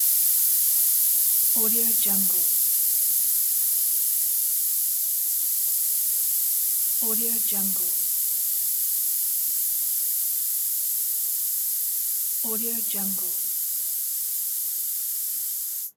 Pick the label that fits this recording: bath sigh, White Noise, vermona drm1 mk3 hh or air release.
air release